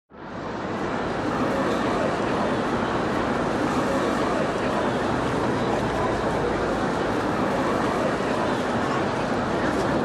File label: City Ambi Street